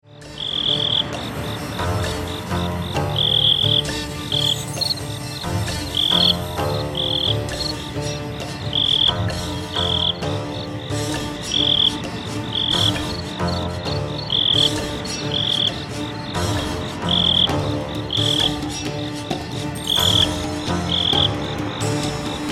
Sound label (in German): Entspannungsmusik